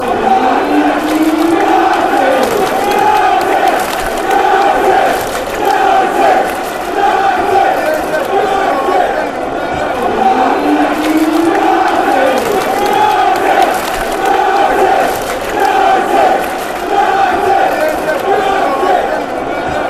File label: united clap, fanchants; pufc